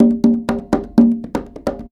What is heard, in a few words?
Percussion Loops, Bongo & Conga; Houseworx